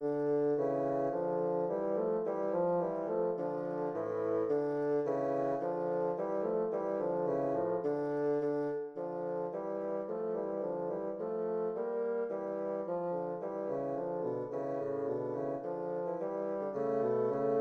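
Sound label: tambourin for two bassoon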